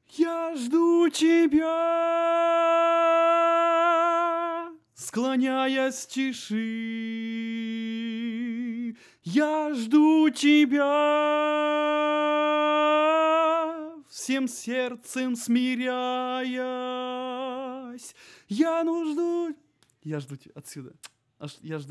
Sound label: tenor